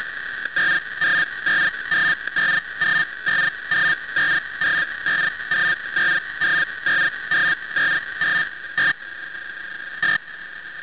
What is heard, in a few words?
pactor